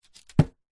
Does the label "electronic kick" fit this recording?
No